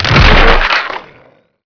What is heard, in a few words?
splat; player